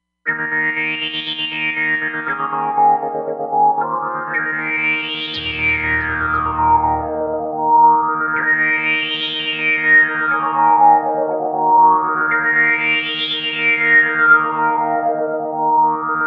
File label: Auto 5th Saws; Roland Fantom XR